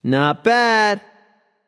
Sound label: happy; smite; voicepack; osiris